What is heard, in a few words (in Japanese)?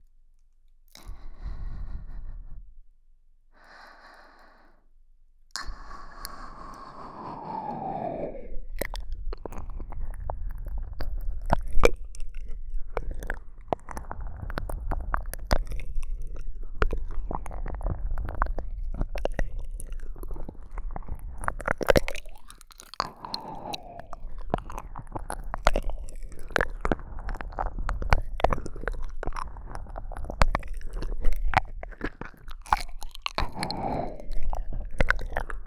ゆっくり吐息舐めコース